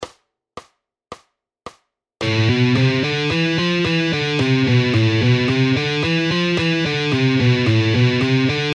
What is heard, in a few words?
Guitar speed exercises